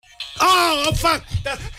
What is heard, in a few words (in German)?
elektroschock kurz; Thomas gottschalk